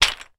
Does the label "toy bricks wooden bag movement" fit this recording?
Yes